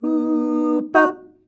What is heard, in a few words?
UUU BAB, MALES; Best Service; Hyper Dance